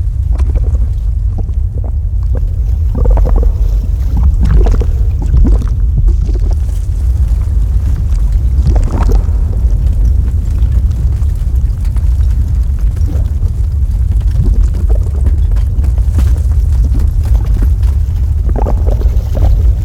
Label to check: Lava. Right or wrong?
Right